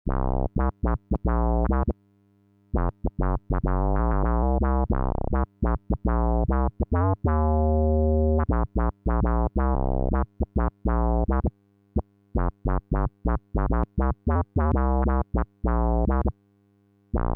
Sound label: pure square, bass